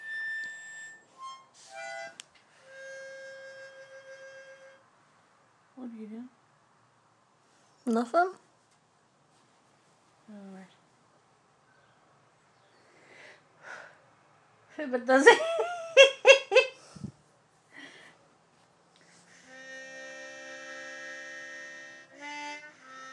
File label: harmonica